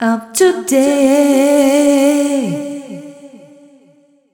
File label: f mel g, fem Vocals